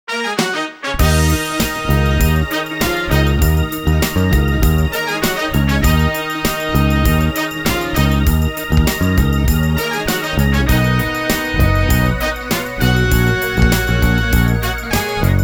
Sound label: sterling sub ray4; sterling sub raymaster